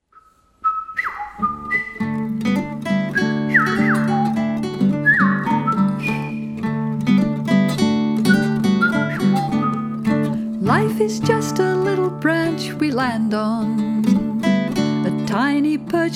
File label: song